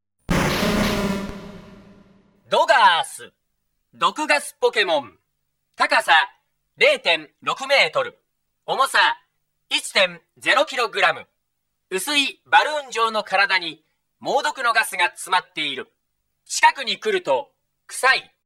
koffing, Pokemon Gameboy Sound; Pokemon Gameboy Sound Collection

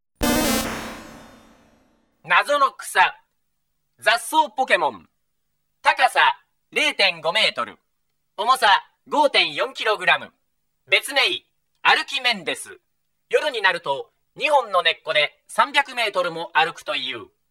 oddish, Pokemon Gameboy Sound; Pokemon Gameboy Sound Collection

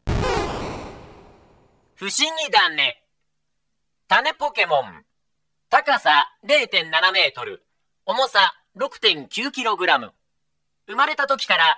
bulbasaur, Pokemon Gameboy Sound; Pokemon Gameboy Sound Collection

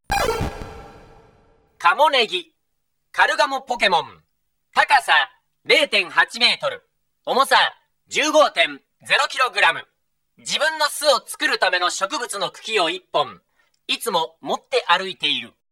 farfetch'd, Pokemon Gameboy Sound; Pokemon Gameboy Sound Collection

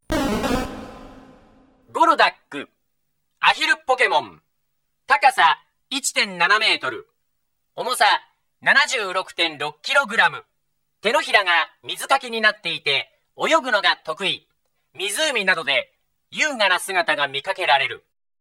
golduck, Pokemon Gameboy Sound; Pokemon Gameboy Sound Collection

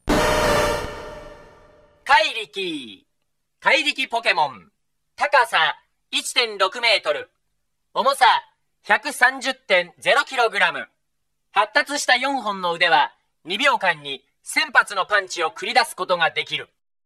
machamp, Pokemon Gameboy Sound; Pokemon Gameboy Sound Collection

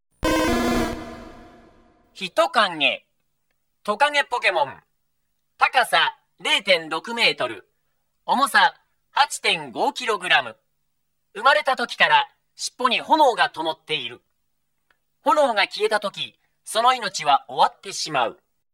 charmander, Pokemon Gameboy Sound; Pokemon Gameboy Sound Collection

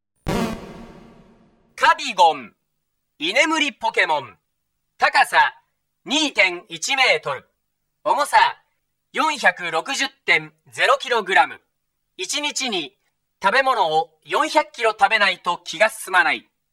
snorlax, Pokemon Gameboy Sound; Pokemon Gameboy Sound Collection

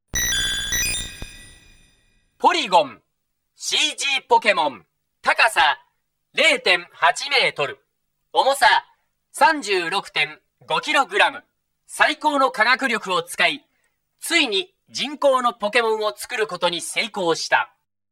porygon, Pokemon Gameboy Sound; Pokemon Gameboy Sound Collection

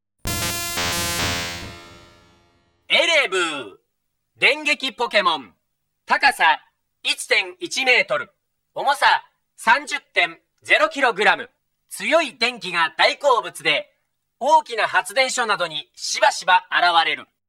electabuzz, Pokemon Gameboy Sound; Pokemon Gameboy Sound Collection

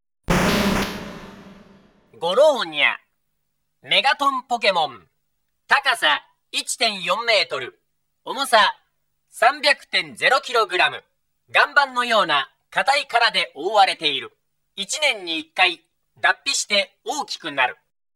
golem, Pokemon Gameboy Sound; Pokemon Gameboy Sound Collection

